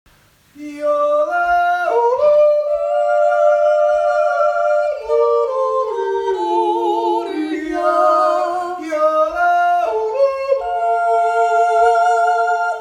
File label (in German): Jodel